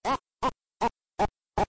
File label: laugh; ganon